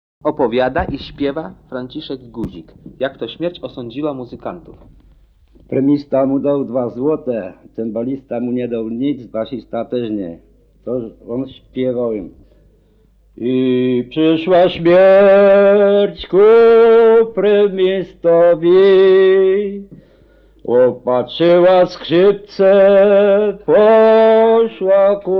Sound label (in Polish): Ballada; jak to śmierć osądziła muzykantów; Głowienka; nagr. B. Linette, 1964